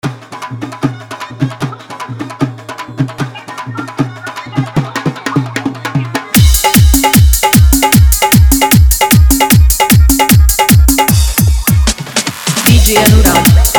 New Full Dhollki Bass Dance Mix

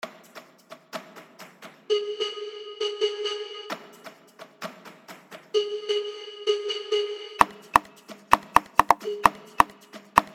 Hard Dhollki Bass Mix; Old is Gold

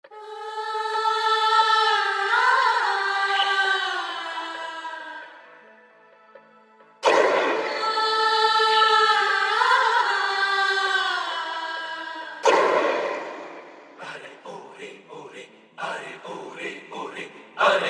Full Hard Dhollki Bass Mix, Old Hindi

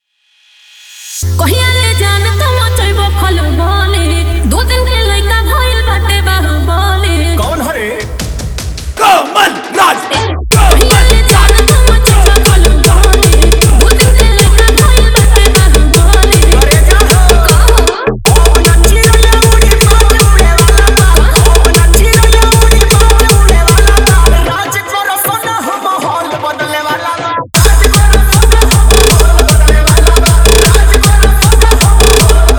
EDM Trance Bass Kadak Remix

